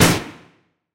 wall spaceship reverb; Golden Crayon Sounds

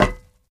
diamond land; Golden Crayon Sounds

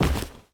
carpet hard land; Original TK Sounds

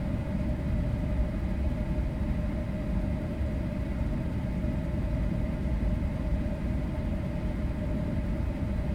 vent; Golden Crayon Sounds

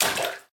sewer step; Golden Crayon Sounds

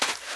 sand step; Golden Crayon Sounds

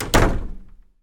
door close; Golden Crayon Sounds

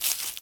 leaves step; Golden Crayon Sounds

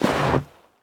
snow step; Golden Crayon Sounds

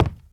hardwood step; Golden Crayon Sounds

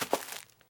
brush land; Golden Crayon Sounds

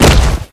generic fall; Golden Crayon Sounds

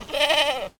goat call; Golden Crayon Sounds

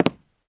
wood step; Golden Crayon Sounds

